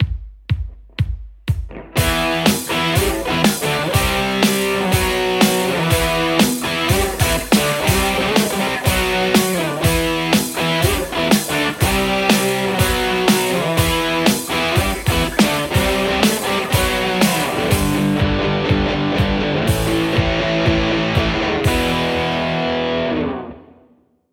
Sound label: white tele 8505; nickcrow